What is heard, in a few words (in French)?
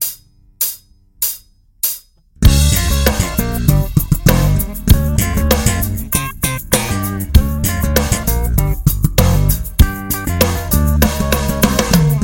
slap, bassiste